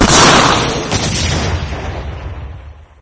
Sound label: rocket fire, ambience